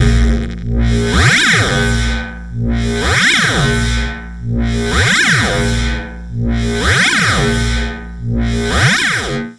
Metal Wobble Board; AMG - Prototype Drum & Bass

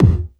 bass drum, 150 BPM, Drum & Bass; Ueberschall; Drum & Bass Resonance

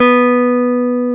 GTR, Jazz Guitar; 90's Sample CDs; Club-50 - Foundations Roland; Jaz-Kessel

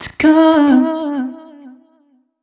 f 132 mel, Vocals, fem

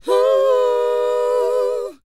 WHOA F#, Vocal R&B & Dance; ILIO; Vocal Planet

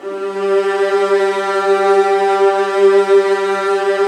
G1, Virtuoso Strings

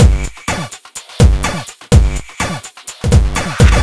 125 Full, Drum Loops 125bpm; Beatdown 1984; Nu Dance Electro

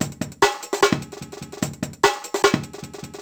SNAP LOOP, drum loop; Yamaha DTXpress

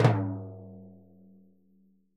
TIMBLO, Synclavier Percussion; ILIO; Synclavier Percussion 2; World & Orchestral

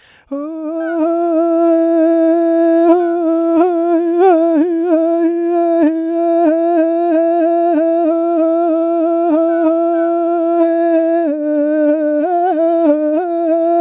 MONGOL V HI, Asian Traditions; USB Soundscan vol.60; AKAI

